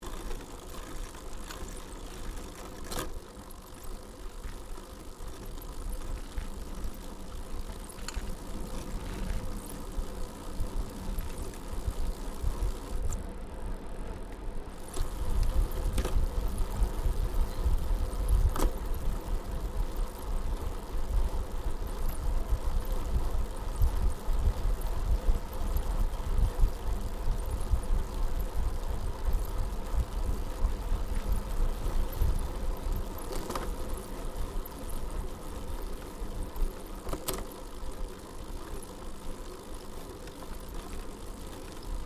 Bicycle Riding Smooth Concrete Pedal Slow Uphill Stereo, 21 speed road bicycle; Giant Crx3